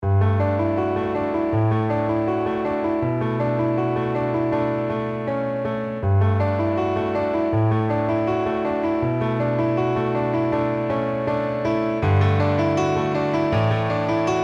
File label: mini grand hard